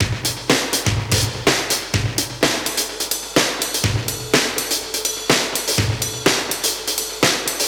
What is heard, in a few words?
125 Classic Breaks, 125